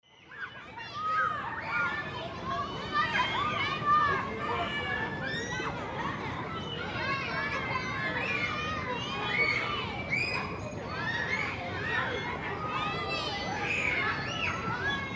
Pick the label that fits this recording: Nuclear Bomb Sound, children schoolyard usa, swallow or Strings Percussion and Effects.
children schoolyard usa